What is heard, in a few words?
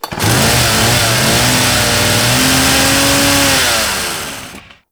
Saw Idle Rev; Warframe; Kuva Ghoul Saw